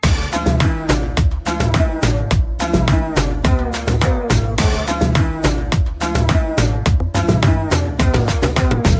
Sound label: Romantic Joy Guitar